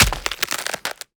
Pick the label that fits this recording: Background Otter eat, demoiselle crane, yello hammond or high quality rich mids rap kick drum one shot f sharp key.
Background Otter eat